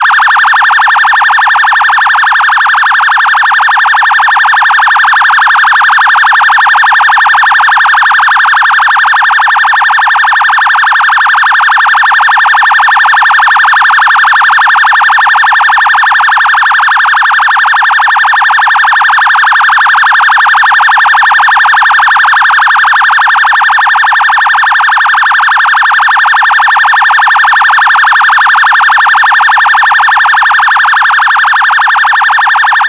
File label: electronic phaser siren